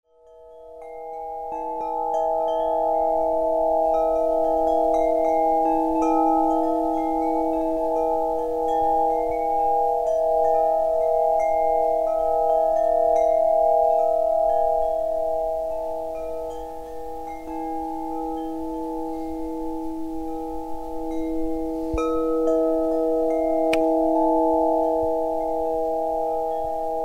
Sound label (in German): orientalischer Bauchtanz